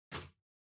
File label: Ceiling Light (Far RC Put)